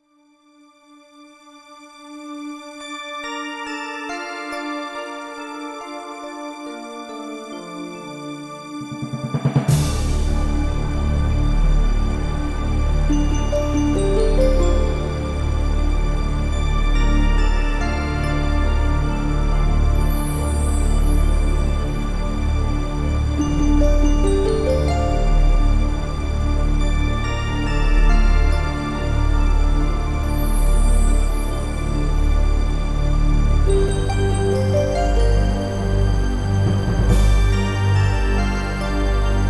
cave; msx; goonies

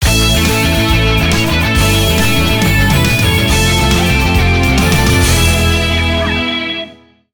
level start; msx; knightmare

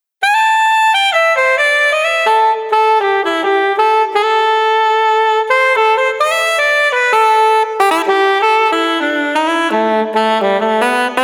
sax solo

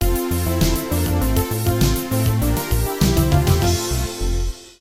level clear; msx; goonies

